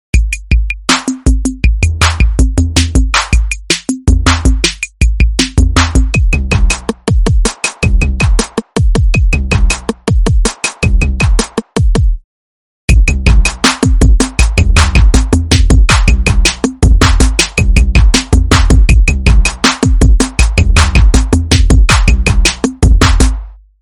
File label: iPhone 11 Pro new